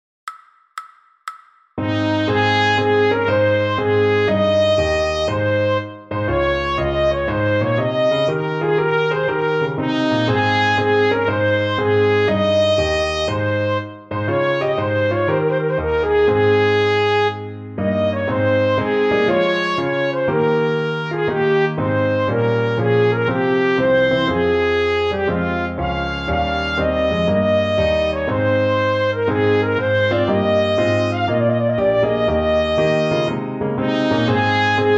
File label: trumpet; Prelude from Te Deum